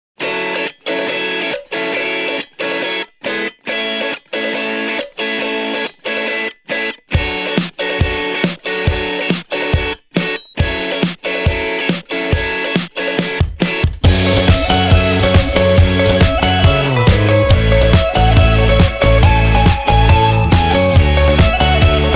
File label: Instrumental